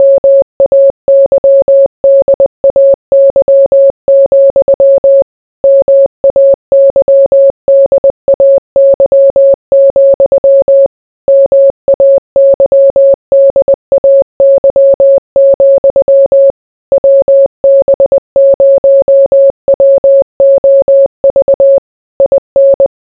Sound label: morse